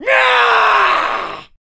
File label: Voices weightlifter scream